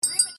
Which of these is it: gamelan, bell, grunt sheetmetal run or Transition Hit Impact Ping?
bell